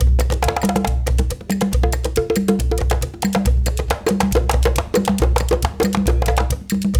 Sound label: 138, Lead Drm, Gyana HiLife; Spectrasonics; Supreme Beats